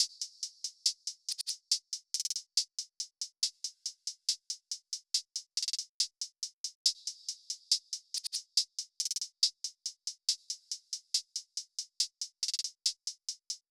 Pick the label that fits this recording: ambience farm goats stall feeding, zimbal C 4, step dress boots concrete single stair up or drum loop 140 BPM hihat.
drum loop 140 BPM hihat